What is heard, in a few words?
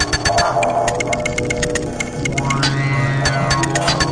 clicky loop; SYNTH AND ELECTRONIC